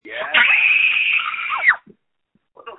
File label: screams